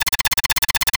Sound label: Arcade Movement, Video Game Sounds